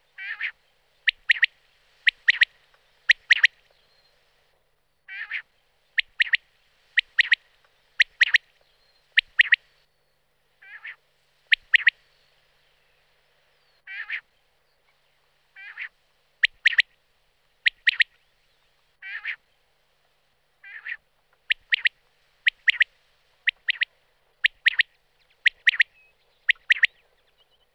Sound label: Quail